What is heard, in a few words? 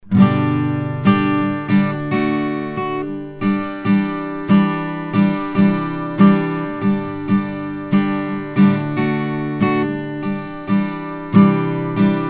Acoustic Guitar; Lauten Audio Oceanus LT-381; Tube Condenser